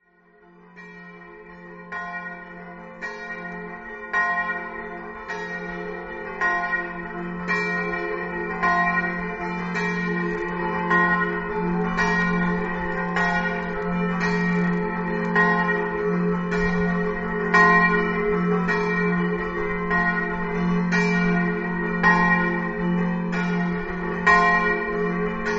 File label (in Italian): campane